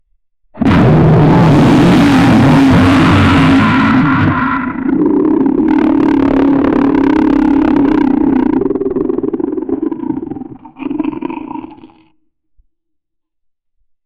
roar guttural beast roar

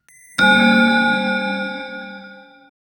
death chime tactical vide

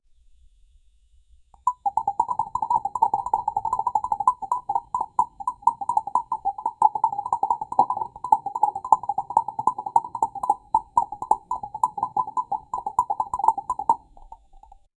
a cup floating sound effe

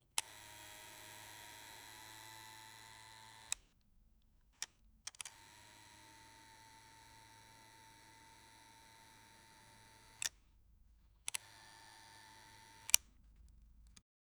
recordering camera zoom

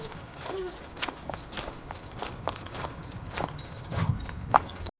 light footsteps on dirt r, open field

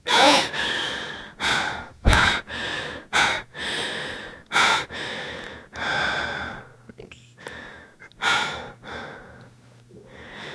years old man holding, mouth closed; 18

